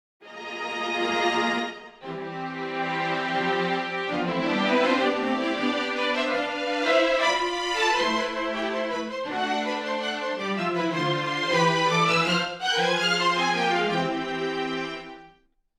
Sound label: STRINGS; TOKYO SCORING